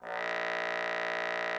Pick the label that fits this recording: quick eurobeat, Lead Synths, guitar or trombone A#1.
trombone A#1